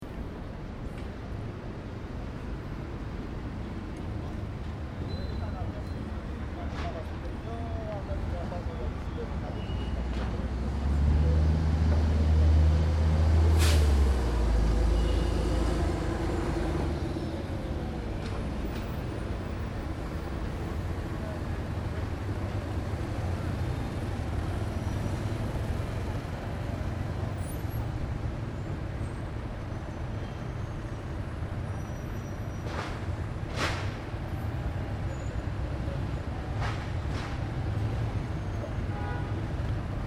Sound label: City Ambience Athens Greece, Corner of Sintagma Square; downtown Athens. Buses, cars, pedestrians, motorcycles and sco